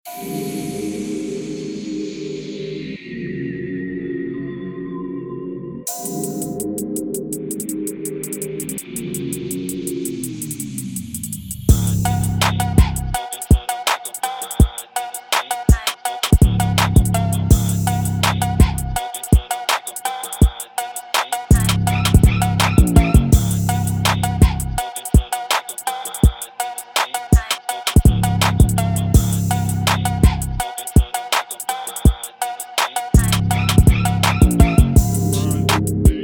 Instrumental

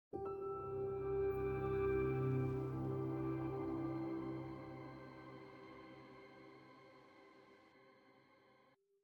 Jingles

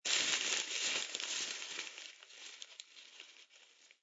Pieces Paper

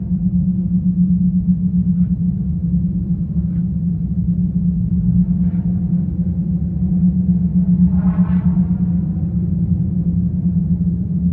Atmo Castle; Blood Knights; Xbox 360

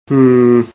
reaction middling; coffee shop

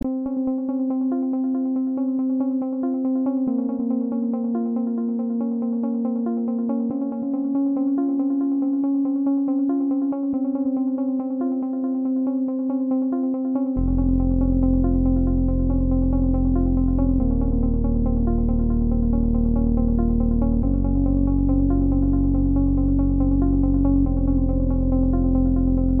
Snow Intro; Musi Snow Intro V1

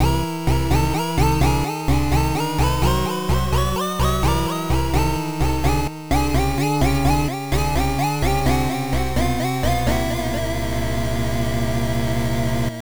Power Down; New Adventure Island; TurboGrafx-16

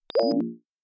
Error

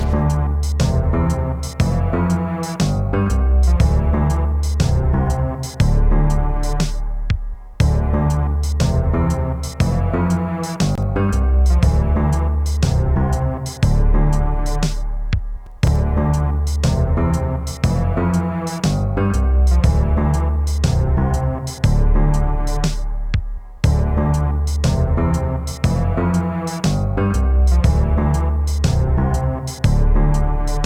Game Over (Space); Project Laser; Brawl Stars; 8-Bit minigame

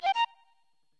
Instr Flute; Backpack Hero

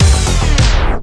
DJ Disco Stop; Plants vs. Zombies Battle for Neighborville

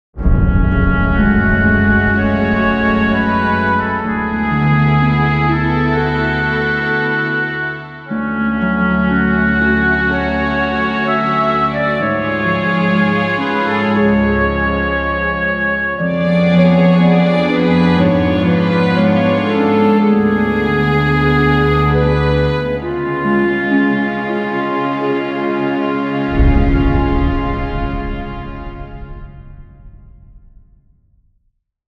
Defeat; God Wars Nihon Shinwa Taisen

